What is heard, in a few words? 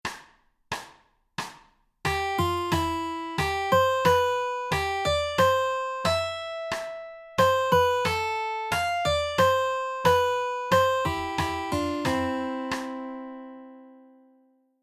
bath melody